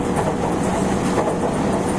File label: 313 3k top; tetsuon